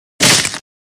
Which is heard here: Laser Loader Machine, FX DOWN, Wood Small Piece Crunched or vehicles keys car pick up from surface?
Wood Small Piece Crunched